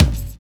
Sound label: LONG FILL; Univers Sons - Kitch 70s